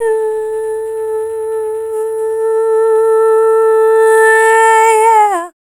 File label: Dance Vocals, FEMALE